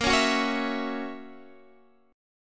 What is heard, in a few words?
F sharp 7th, guitar